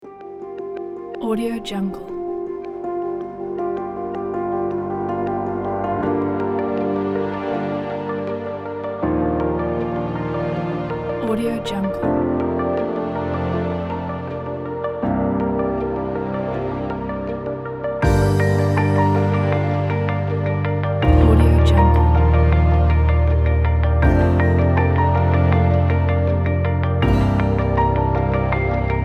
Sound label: magic kit